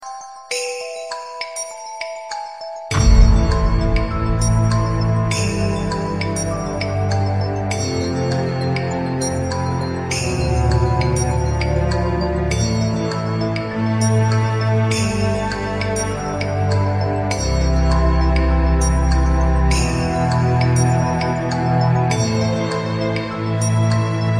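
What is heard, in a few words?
cool relaxing tone; ringtones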